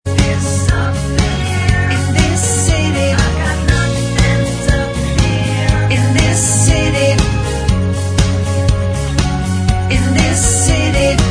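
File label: backing vocals, backing tracks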